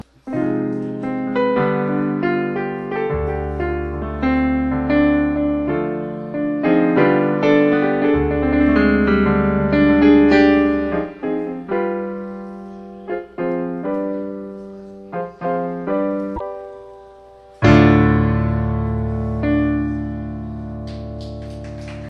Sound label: solo, Regge; Cafe Regge 2003